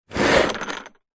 industrial power tool zip case slide on table

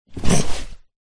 animals dog labrador snort; zapsplat

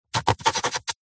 office quill pen writing siganture

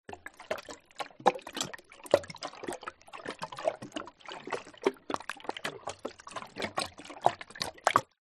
nature water under thin layer ice movements

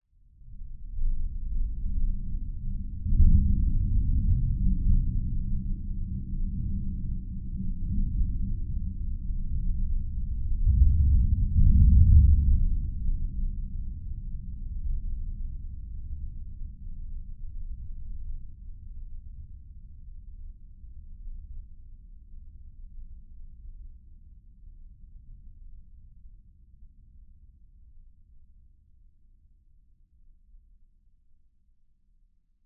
nature thunder rumble just low frequencies

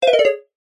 game sound synth mallet style short negative

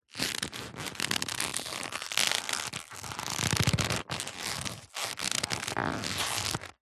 foley leather baseball glove movement creak

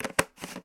household tub plastic lid on; sound effects one

